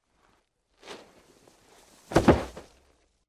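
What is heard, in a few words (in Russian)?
мягкое падение в комнате; Звуки падения человека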